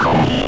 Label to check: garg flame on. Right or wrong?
Right